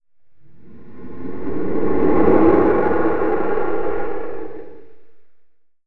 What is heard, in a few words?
LOW WHARR, VOICE; Rarefaction - Poke in The Ear With A Sharp Stick